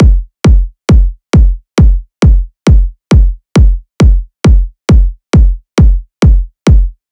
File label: Kick Loop 135, Techno Drums